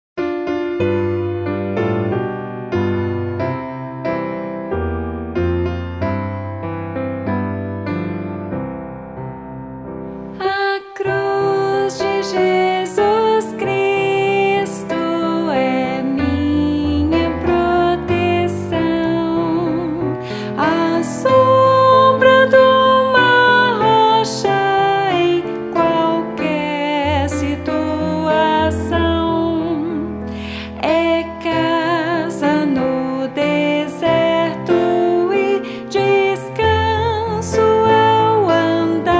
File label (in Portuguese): voz piano